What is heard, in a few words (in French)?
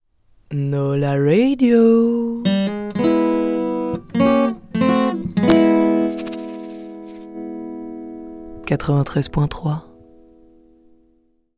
JINGLE LIVE